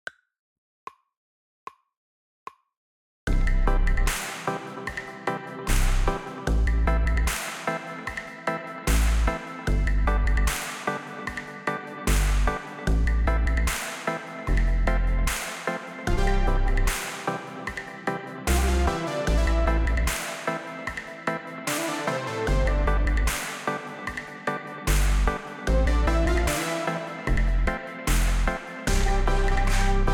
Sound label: drumless